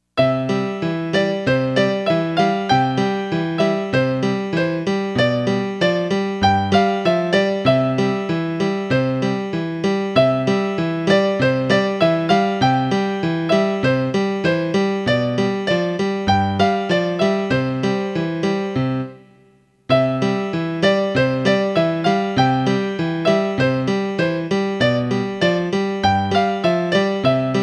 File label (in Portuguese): piano musical passeio matinal